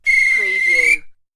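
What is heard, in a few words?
Referee Whistle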